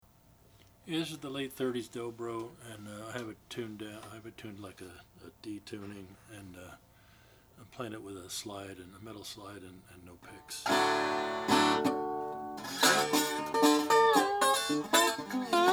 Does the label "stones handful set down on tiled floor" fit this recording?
No